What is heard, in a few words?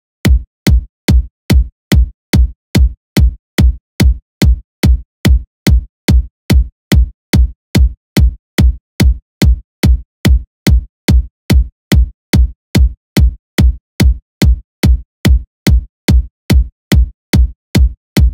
bgm vegas kick; Pac-Man Championship Edition 2